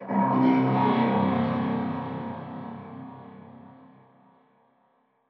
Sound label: strider distant; ambient; combine